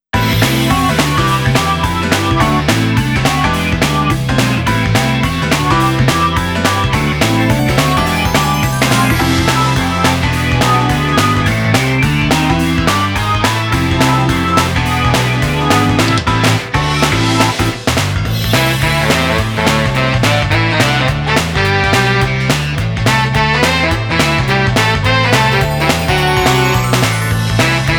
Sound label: Stage BGM; Arcana Heart; Heart Full Sound Collection Best 2018; Lilica Felchenerow